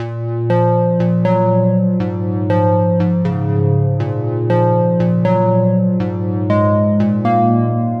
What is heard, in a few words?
Melo Dramatic Synth